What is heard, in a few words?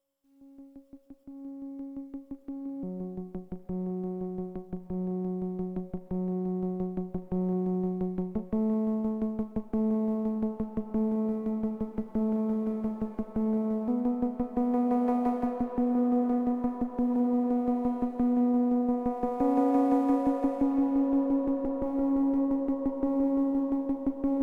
impulse train duo